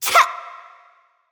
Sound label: Death; paladins; Maeve